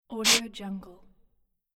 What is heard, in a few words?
sniff